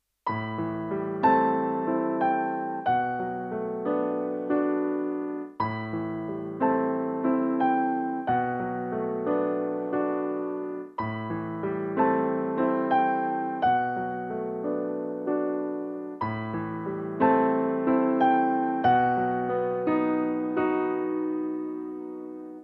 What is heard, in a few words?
breeze reminder